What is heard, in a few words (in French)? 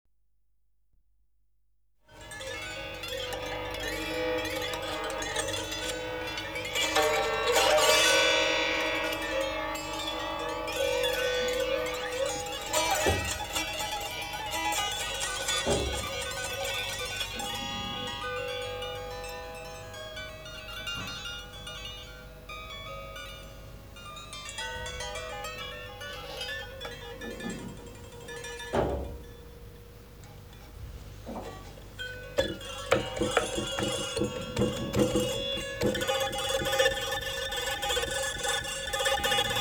cithare strette